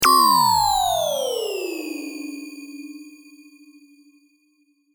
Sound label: Siren down